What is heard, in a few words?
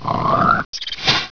Critter Spit Attack